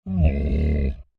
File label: Creature Large Moan Irritated; Monsters; Horror; BigRoomSound